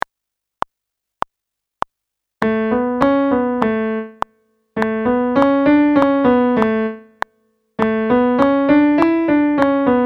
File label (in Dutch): Toonladder A oefening piano